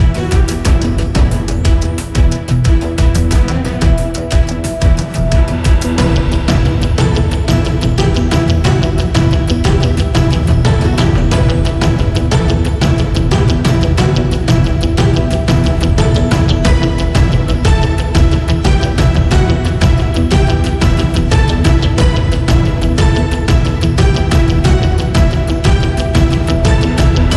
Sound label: tension violin composition; gerilim; By Burak Sound Bank; tension vol 2